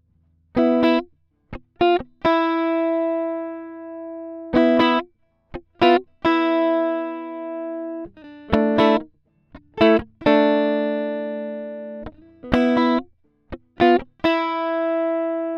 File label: 112 gtr riff dry